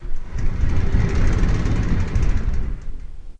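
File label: Shower Door Glass, Shower Door, Glass; Slide Fast With Roller Bearings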